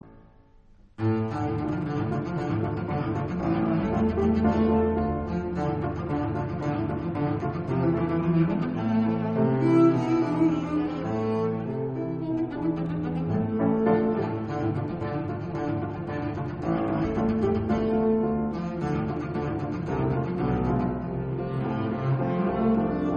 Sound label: arpeggione